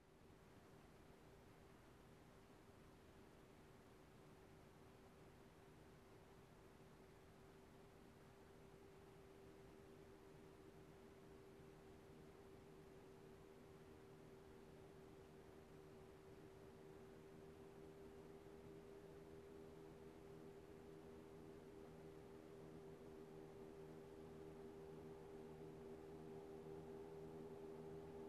Motorcycle approaching, turning off

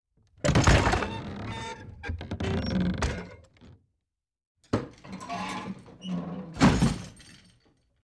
wooden door opens and closes with creak

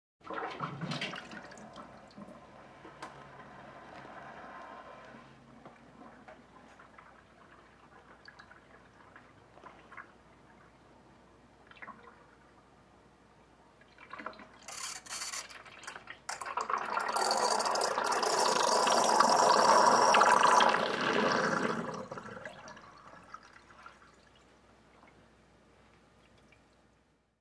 Water drain in the sink

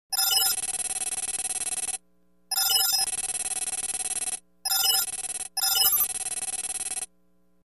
information read signal

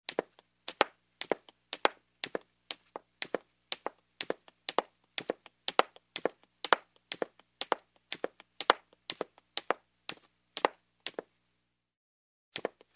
Steps on a wooden ladder in heels

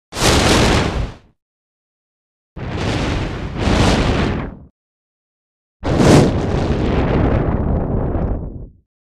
Flame in the wind; fire

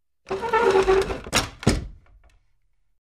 wooden door closes with creak

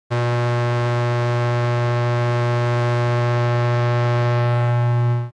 Electronic signal

